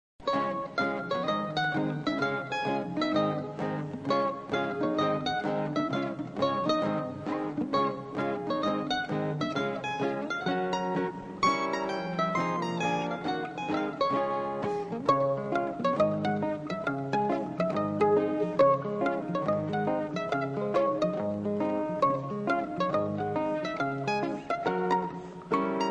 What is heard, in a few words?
swing time